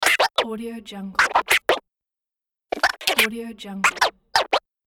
vinyl scratch

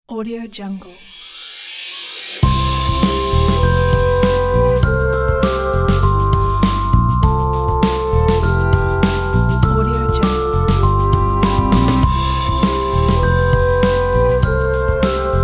holiday christmas loop

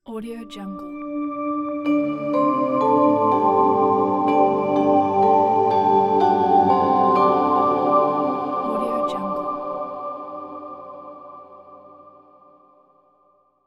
gentle mystical logo

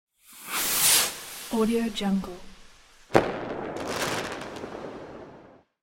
fireworks

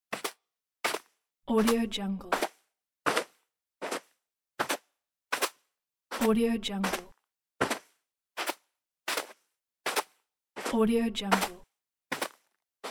footsteps on carpet; applehillstudios

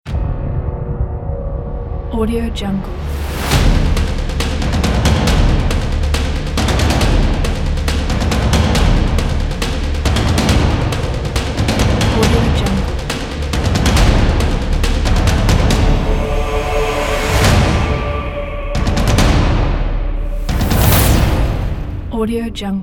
cinematic percussion ident

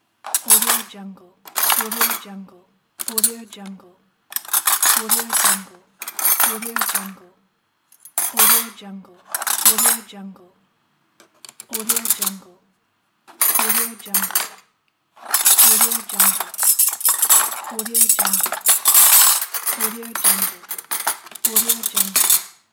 cutlery; flaviocortizo

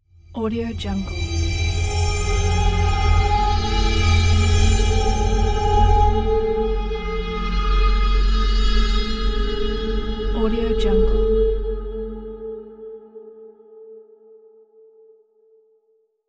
eerie musical logo; hollywoodedge

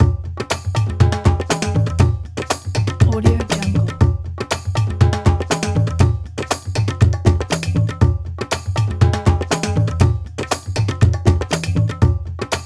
music drum circle; prosoundfx

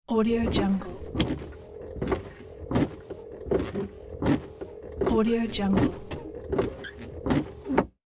vintage car windshield wipers slow speed; sound-ideas

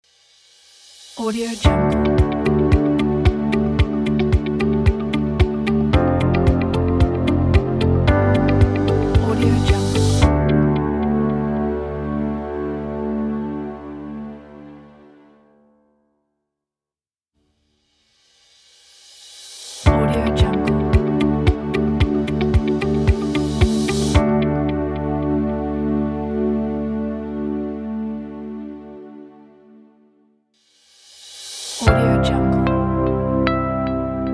corporate logo pack

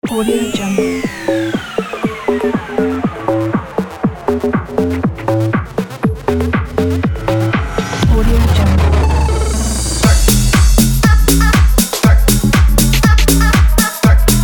tech house intro

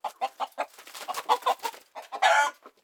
poultry chicken; soundbits-soundfx

